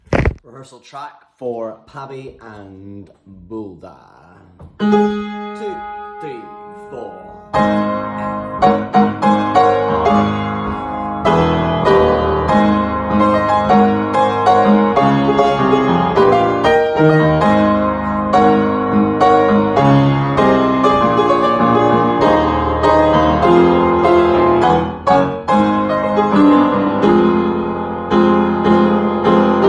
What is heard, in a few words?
Backing; Pabbie; Bulda; Disney's Frozen